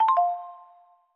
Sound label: Undock; Asus Zenfone-3-Deluxe